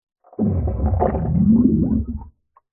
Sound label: human fart underwater